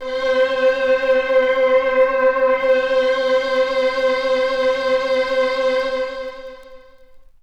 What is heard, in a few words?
Sub37 Modulations Vinyl Synths C4; vinyl keys